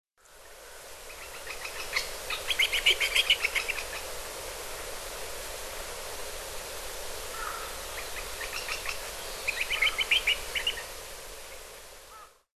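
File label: aogera, Japanese Green Woodpecker; hyogo; koe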